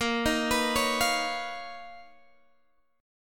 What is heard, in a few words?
B Major Sharp 11th A sharp